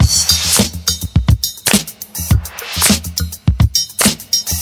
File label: 104 bpm modern rap breakbeat d sharp key